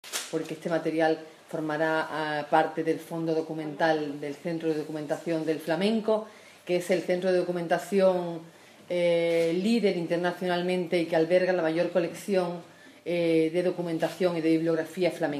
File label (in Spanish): flamenco; archivo municipal